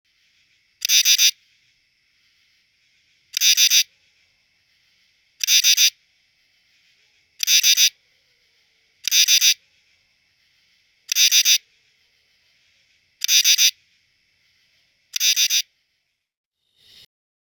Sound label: true katydid